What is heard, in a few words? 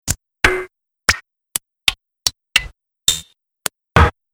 Hit Pack 10 Hits, impact sound effect; Hit Pack 3; Miscellaneous Impact Sound Effects; Andrew Fleming